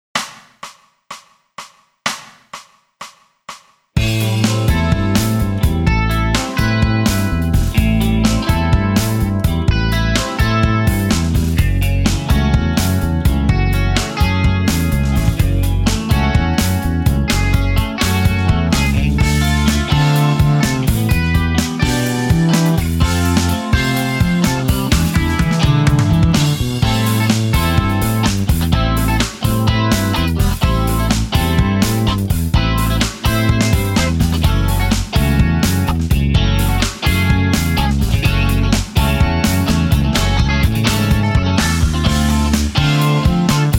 Prepared Rhythm Guitar Playing Example, electric guitar; electric guitar performance diploma